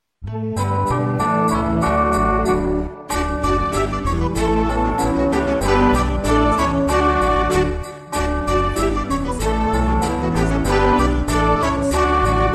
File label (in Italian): ding dong base veloce re mag; Natale 2013